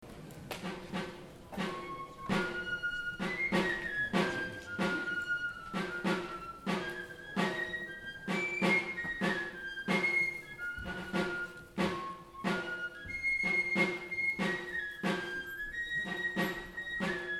Filarmonica; Aurresku de honor

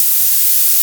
whitenoise looped

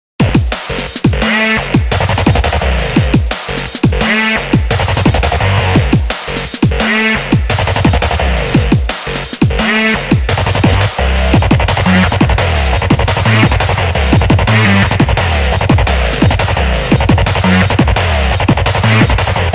Drunk n Bass

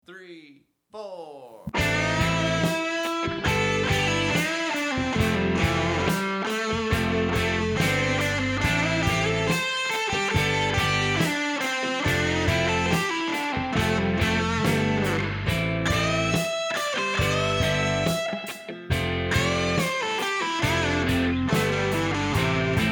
Solo

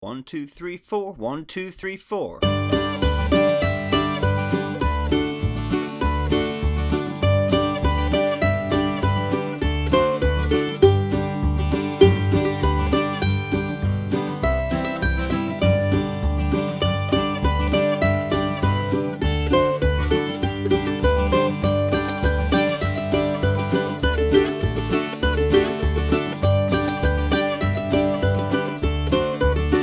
Camptown Races Beginner Mandolin 100 BPM

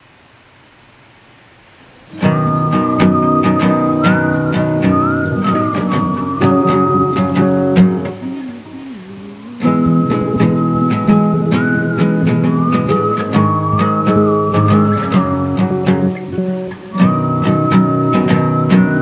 whistle alternate version

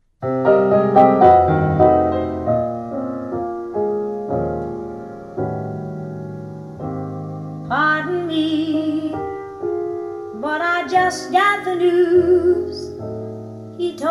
tube

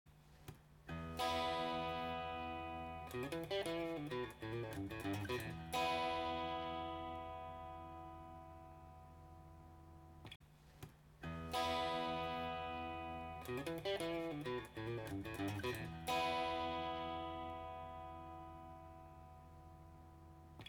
Key E Minor Major Penta

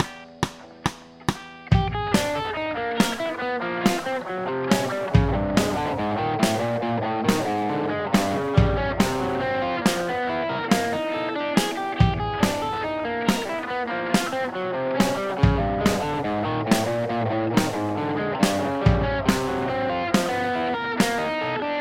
scale

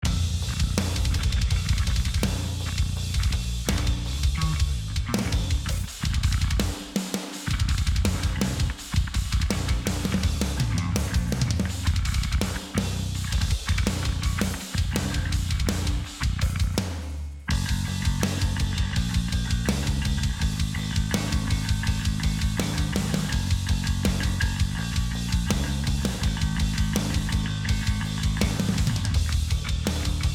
Reamp bass

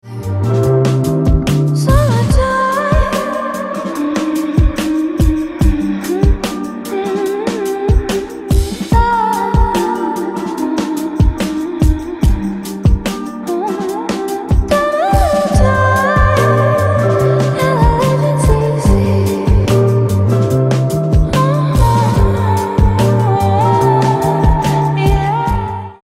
Ringtone

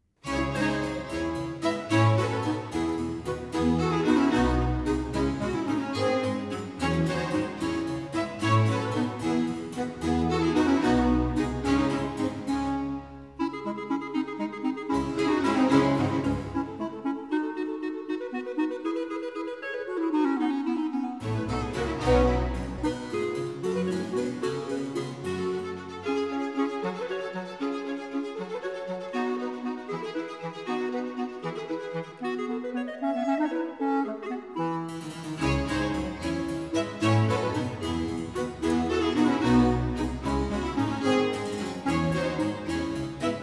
Concerto In D Minor For 2 Chalumeaux, Vivace; Telemann; 4. Vivace

